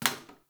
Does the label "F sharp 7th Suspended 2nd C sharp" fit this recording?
No